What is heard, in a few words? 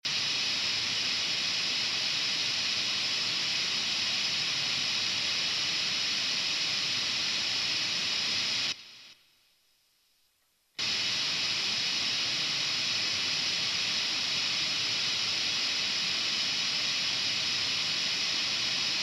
pink noise